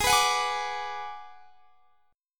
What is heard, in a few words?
G sharp Minor 7th Flat 5th; strummed; x,23,21,23,21,22; G#m7b5